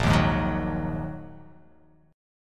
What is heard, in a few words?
A sharp 7th Suspended 4th Sharp 5th, chord